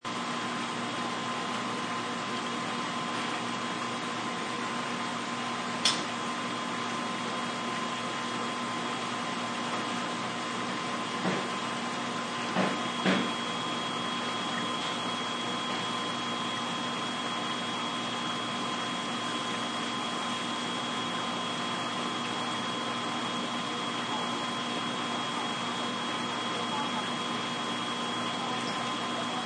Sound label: Asian Market, Loop ambience recording of a relatively empty food court inside an asian shopping; Loop ambience recording of a relatively empty food court inside an asian shopping center; Cafeterias; Backgrounds; Cafeteria Sound Effects